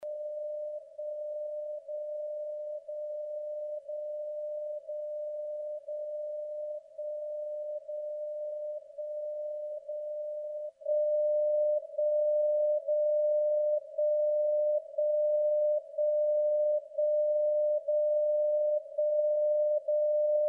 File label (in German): Zeitzeichen VLF 60khz